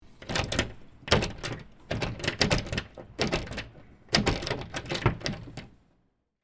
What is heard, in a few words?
Wood Tool Chest, Industry, Wood, Tool, Chest, Handle, Shake, Pad, Lock, Long